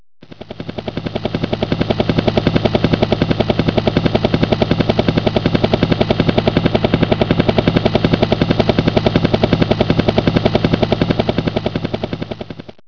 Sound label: helicopter